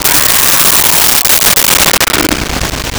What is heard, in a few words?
LoFi Synthetic Lion Roar; Vintage Cartoon; Comedy Vocals; SONY PICTURES SOUND EFFECTS SERIES